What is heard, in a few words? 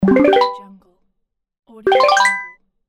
game upgrade